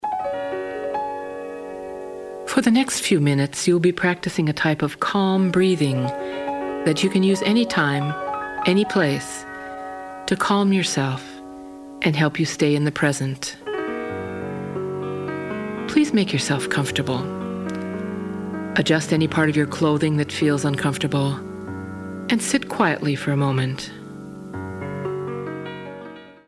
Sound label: Calming Breath